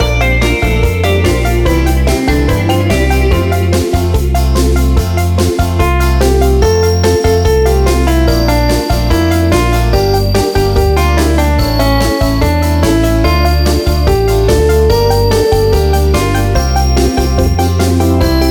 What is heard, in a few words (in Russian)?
karaoke